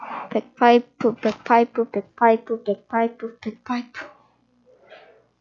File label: bagpipe